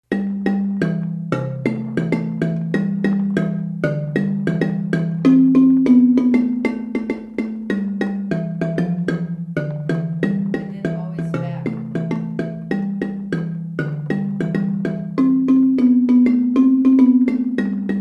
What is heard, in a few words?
baritone echos, marimba; In the high rolling section, the lead eventually works up to a descending high line, which the baritone echos